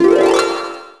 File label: Ring Bon; Finding Nemo; XBOX